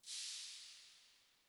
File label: HAT O, HI-TECH KITS; Crypton - DrumBase5000